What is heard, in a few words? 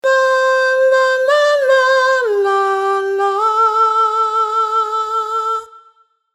Pop Melody